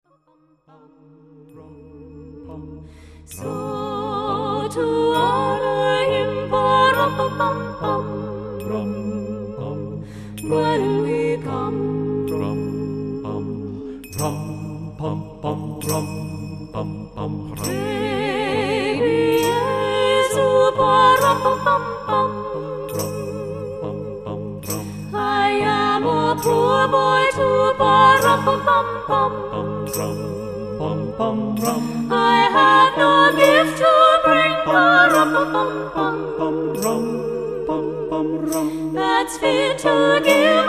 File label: Carolers; Minneapolis